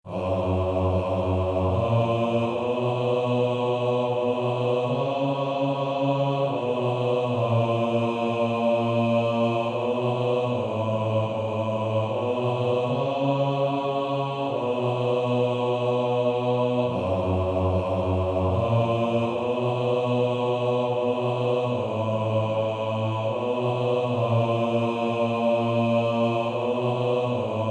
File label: Male Choir; Coventry Carol; Bass